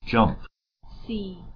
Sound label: jump